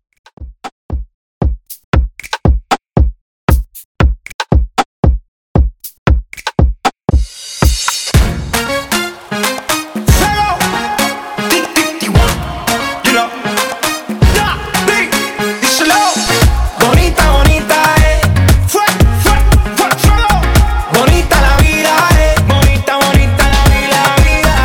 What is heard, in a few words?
In Outro Urbano 116Bpm Clean; djyan